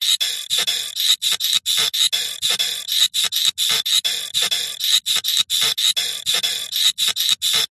industrial machine cogs gears small mechanical sequence; zapsplat